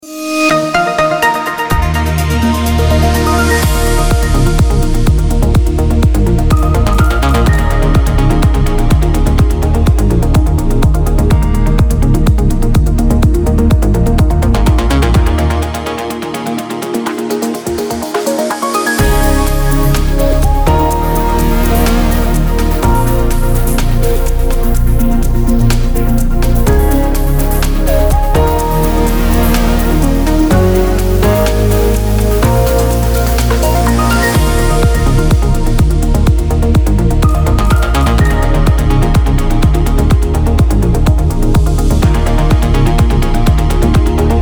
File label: Traffic